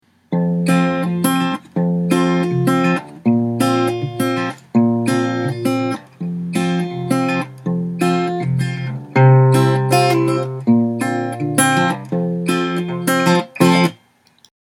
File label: mic acoustic guitar mbp mic; samson meteor